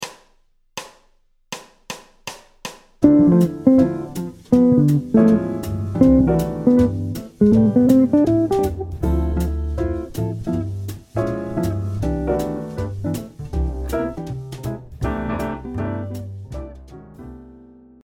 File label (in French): Phrase sur Cadences ii V7 I∆, guitare jazz; en majeur